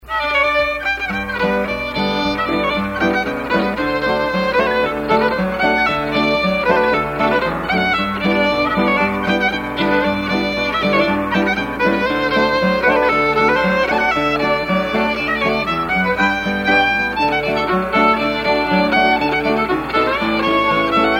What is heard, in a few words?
Polka